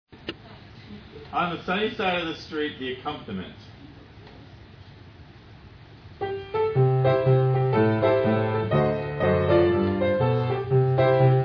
audition accompaniment

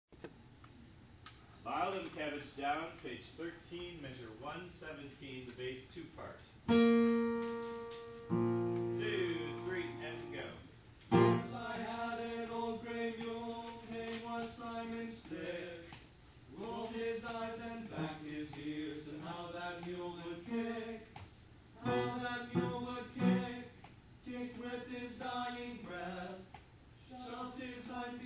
BASS 1

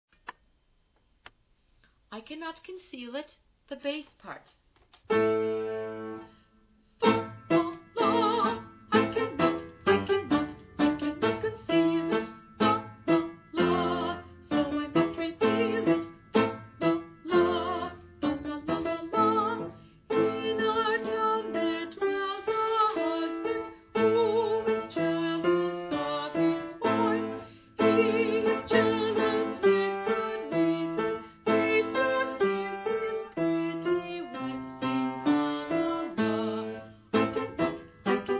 BASS

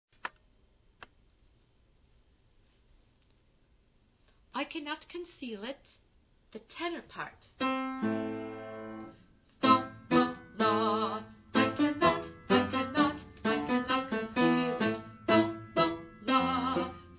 TENOR

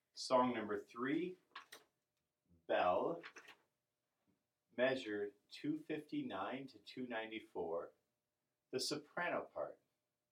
soprano

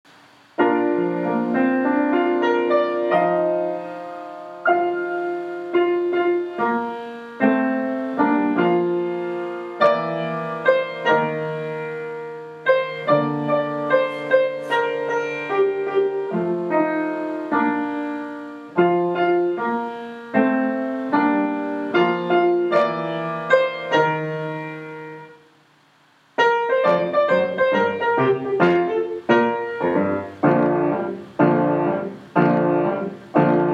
Accompaniment

